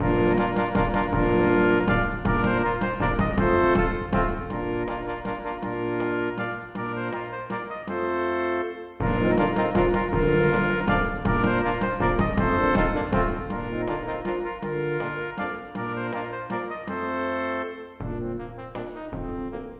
Menuet for messingkvintett (ad lib trommer); Aamodt

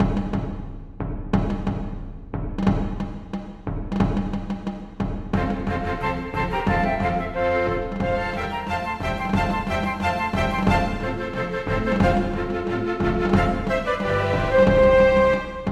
March for fløyter, strykere og trommer; Aamodt

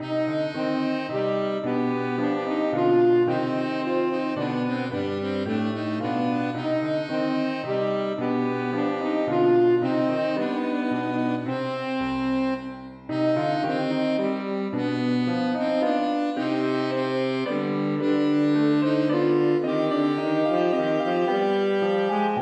Menuet for altsaksofon, tenorsaksofon og piano

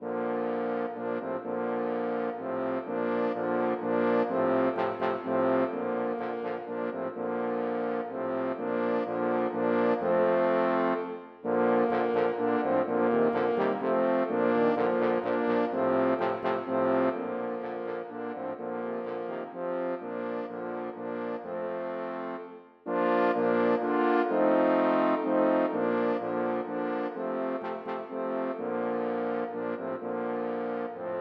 Duett arrangert for fire tromboner baritone

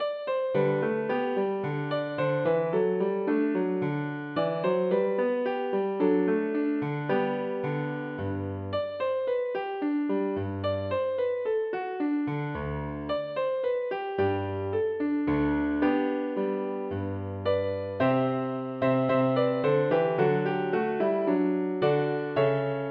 Allemand for piano; Bang

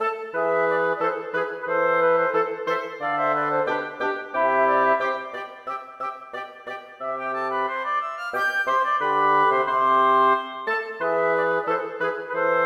Gavott en boure for blåsekvartett uten cembalo; Hertz

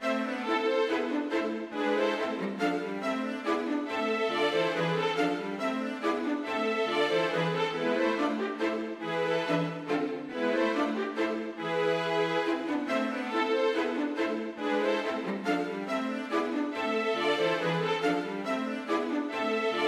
Menue for to fioliner og to celloer; Bang

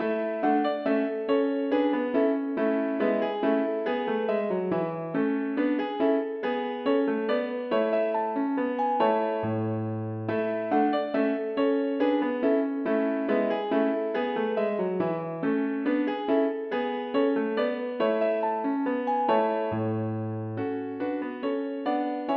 Menuet for piano

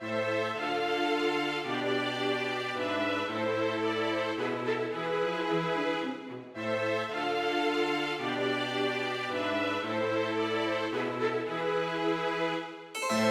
Menuet for strykekvartett og (ad lib.) cembalo, med cembalo; Hertz

